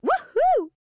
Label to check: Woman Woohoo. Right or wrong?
Right